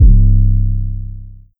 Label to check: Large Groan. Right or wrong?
Wrong